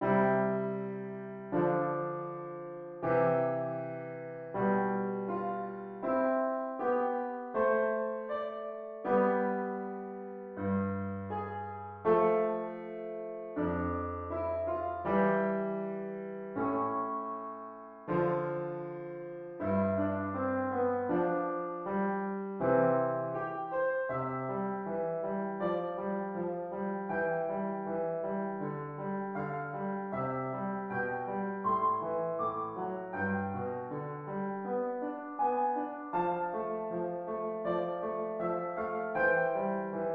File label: pathetique sonata piano